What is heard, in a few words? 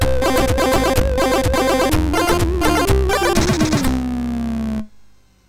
sid MOS6581R3; Yaemon; Twin-Down